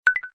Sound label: Video Record, ui